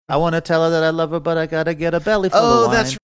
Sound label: singing portion